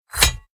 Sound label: Control Select Down